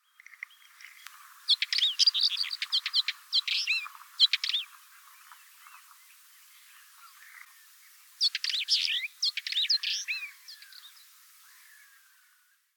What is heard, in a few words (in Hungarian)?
hantmadár Oenanthe oenanthe